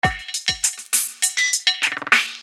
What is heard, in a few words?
Filter Groove